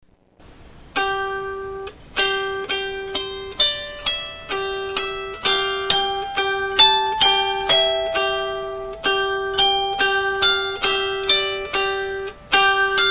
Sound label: harp